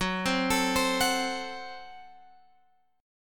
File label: Gb Diminished, down, Guitar, Open G